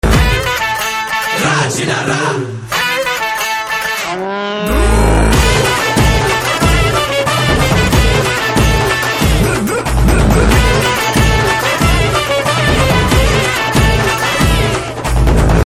Drum Beats Telugu Ringtone

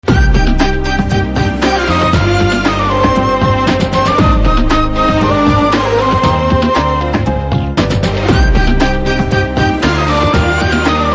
BGM Telugu Ringtone; Maharshi

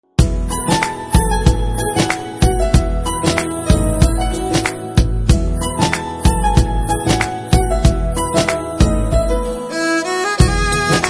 BG Tone, Telugu

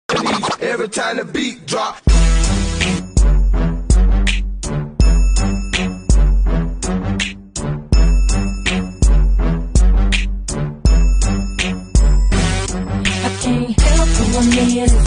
Beat Drop

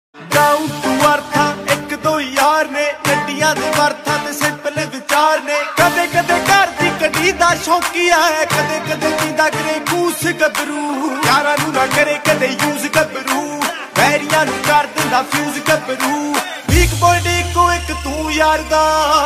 Desi Punjabi Ringtone

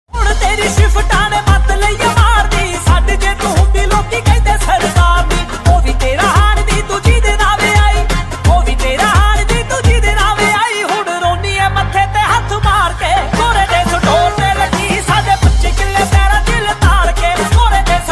Punjabi Song Ringtone

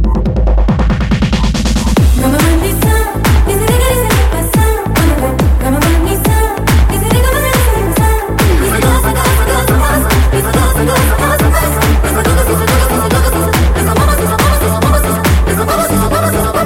Theme BGM Telugu Ringtone

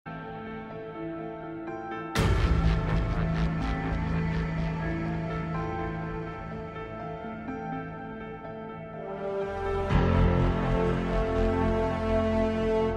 Pubg Lobby Music Ringtone

